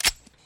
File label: switch; weapons